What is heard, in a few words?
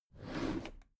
packed drawer smooth open regular halfway